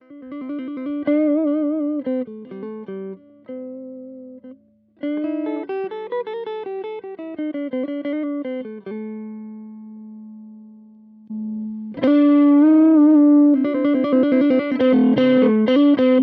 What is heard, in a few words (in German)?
Fender Studio Kompressor; Compadre